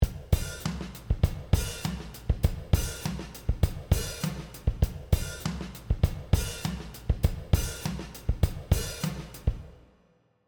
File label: Drum